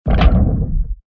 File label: Metal sliding door